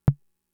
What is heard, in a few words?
Tom, Fricke MFB-522